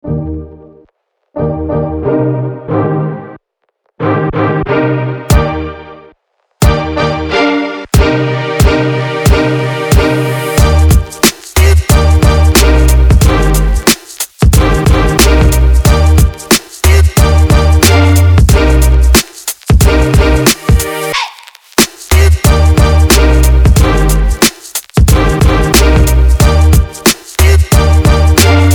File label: Hip Hop